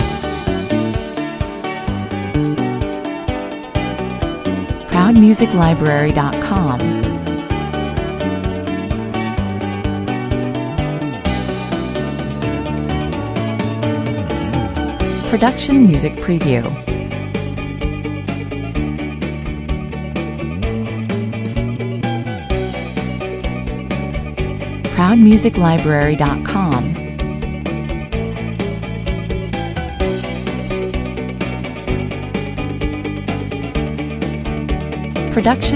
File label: corporate loop